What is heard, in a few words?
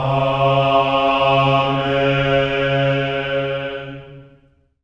amen; Spectrasonics; Symphony of Voices; GR AMENS